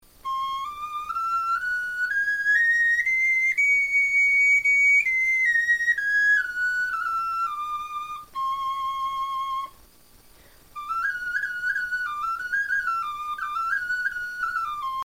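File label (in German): tonleiter, Knochenflöten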